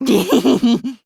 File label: giggle; hifumi